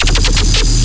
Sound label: Bass Hit D#, Dubstep